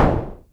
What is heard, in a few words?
SYNCUSSION